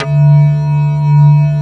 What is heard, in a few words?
Prophet Five